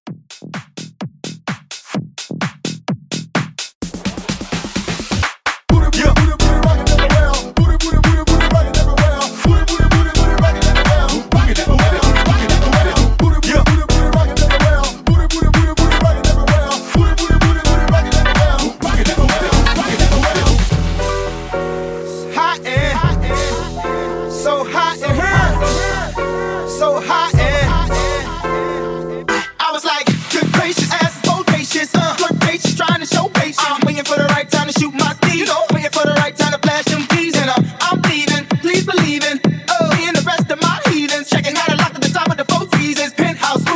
Club House 128

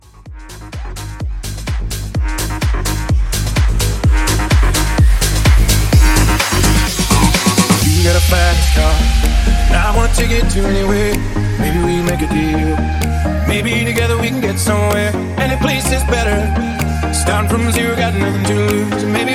Rave Hybrid 127

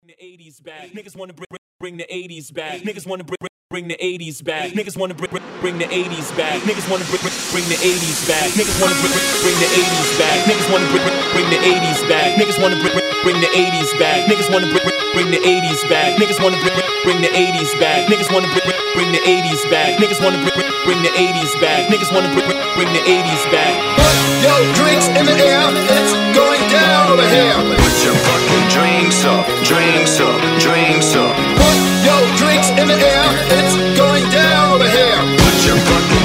80s Rock Acapella In 126

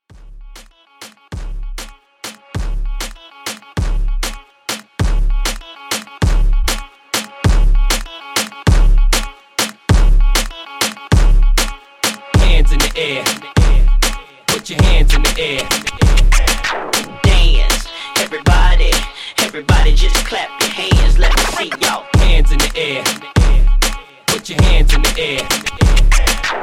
Moombah 98

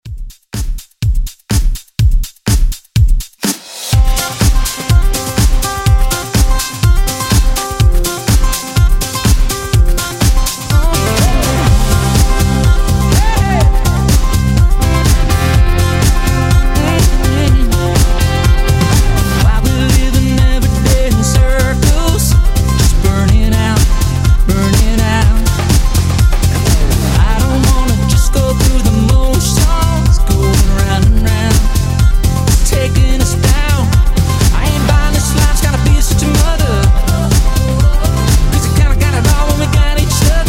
Extended Country Redrum

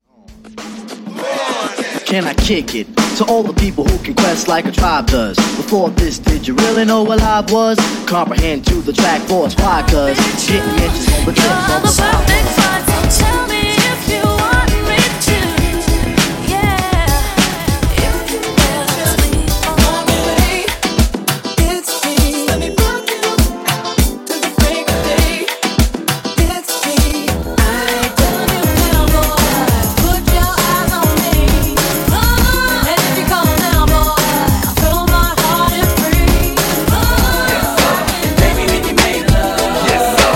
RnB Party MegaMix The Old School Version 100Bpm; CleanDirty

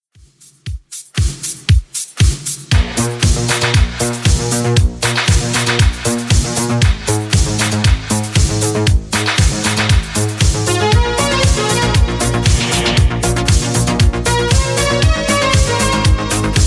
80s Redrum 117 bpm

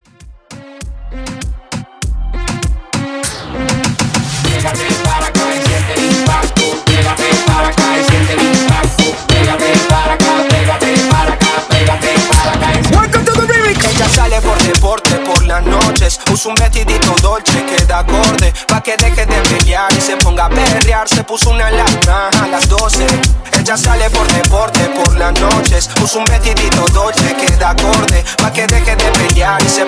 Reggaeton Party 99 BPM

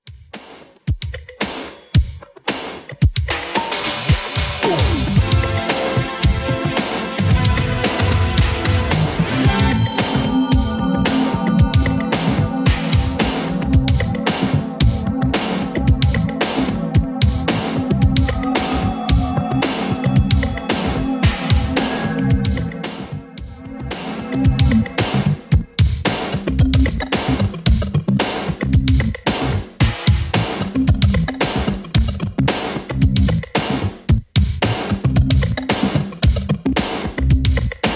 80s Redrum 112bpm CLEAN

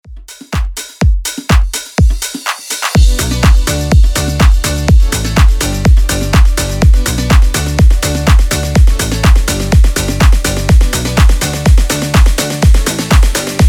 future house 124 bpm

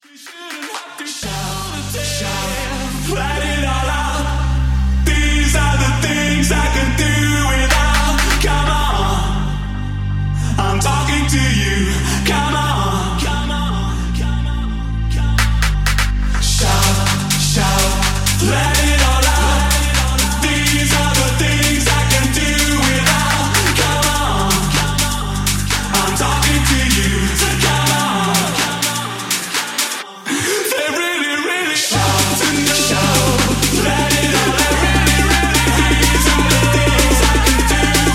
Bass House; Remixfreakz

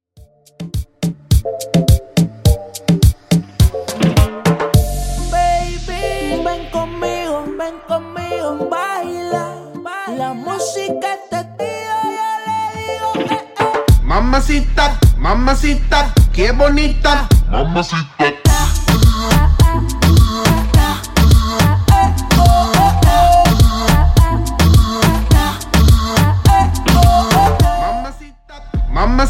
Redrum 105bpm

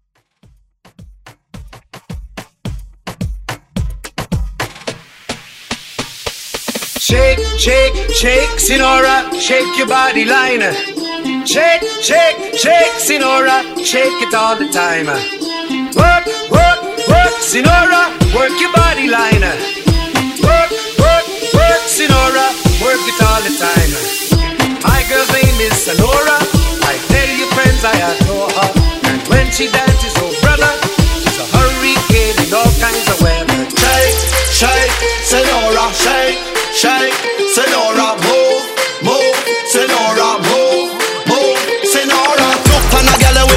Moombah

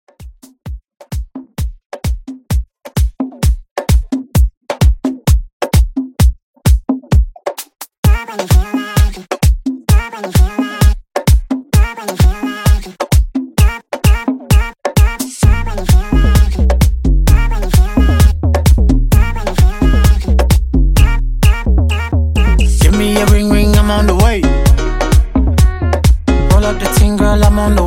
Club 130

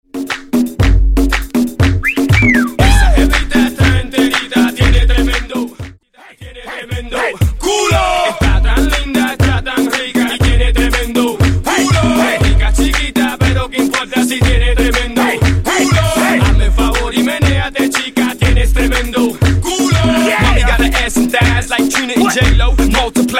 Whisper Mix Redrum

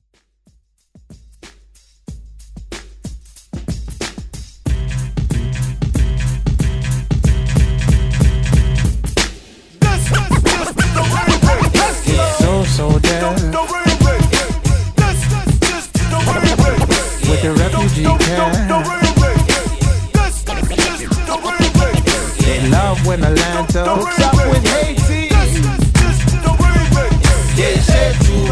Throwback Quick Rework

